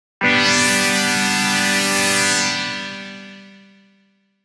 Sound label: Dream Distant Pad; jup8